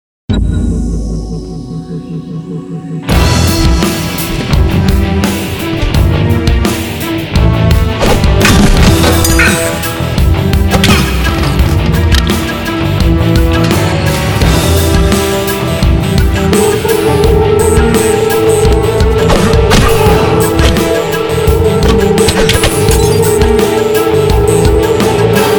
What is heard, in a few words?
Wizard Sword Fight Score Sfx